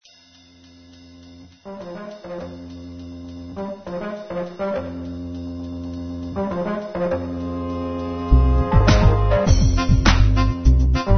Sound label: jazzy